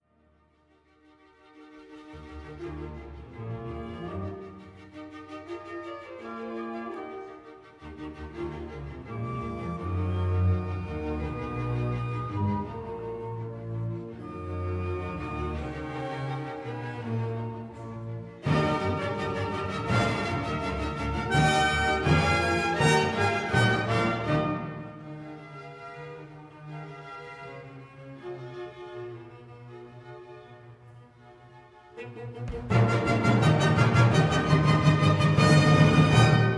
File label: Drum Roll Finale; Haydn; Mozart